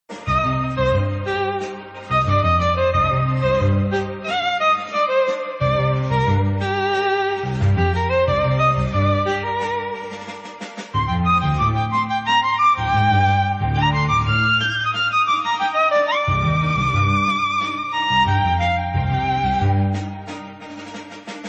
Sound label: Violin version; Batman (long)